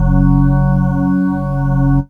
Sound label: Korg Poly 800 Organ C4